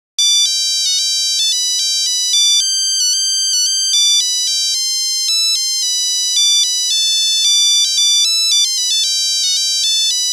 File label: Nokia Buzzer; 8310; Brave Scotland; Windows Phone apps